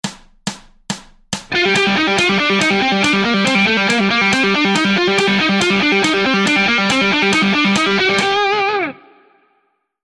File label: Guitare; Les plans de gratte